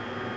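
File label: LIFT LOOPS; lander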